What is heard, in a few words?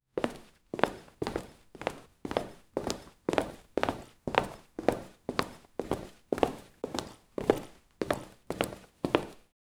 sneaker shoes footsteps